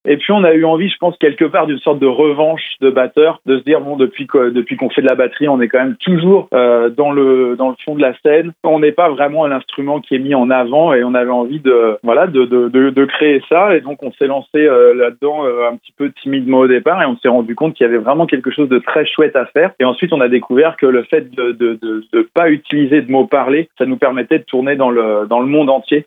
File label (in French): revanche des batteurs